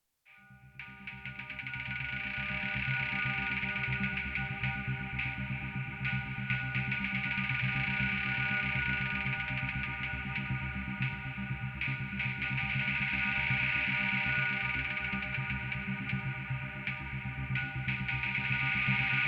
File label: dark atmosphere sound effect